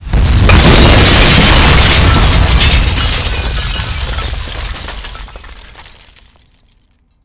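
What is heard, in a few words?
sniper escape building exp; biulding; ModernWarfare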